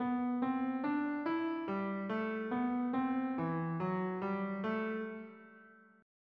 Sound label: Bach C Major Invention